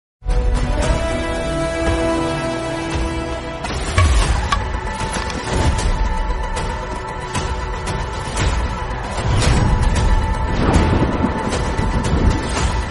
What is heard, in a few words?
bgm telugu; a1 express; telugu movie bgm